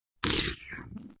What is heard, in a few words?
Slurp, Slurping sound.; Slurping Sound Effects; Human